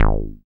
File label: Bass SH-101